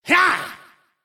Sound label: attack; Mad Mage; Mob Voice